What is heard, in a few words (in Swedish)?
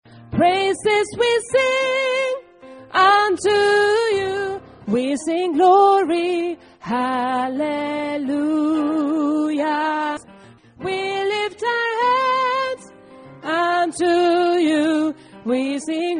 alt, stammor